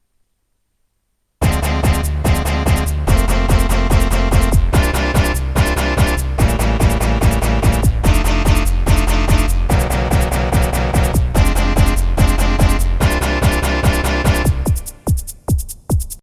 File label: chord